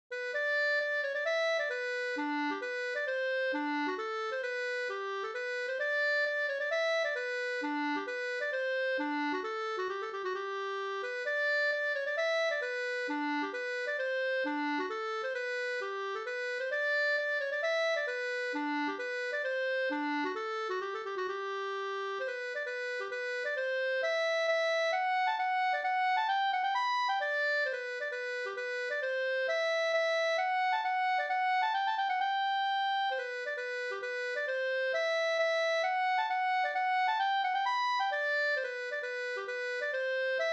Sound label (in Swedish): hambopolska